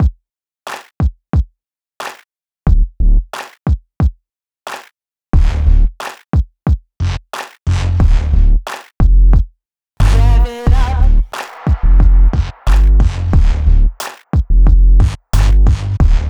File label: Stadium Hip Hop